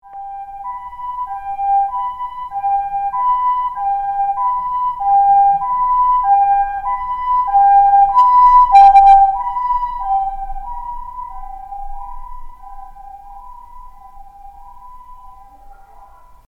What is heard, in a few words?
ambulance passing